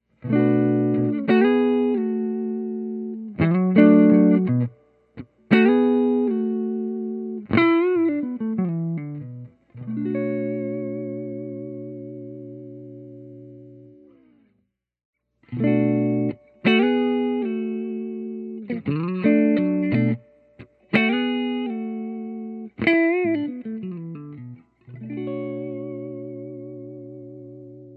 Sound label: p90 clean, guitar